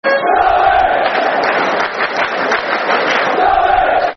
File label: Horn Derby, Football Chants (Soccer Songs); Derby County Dcfc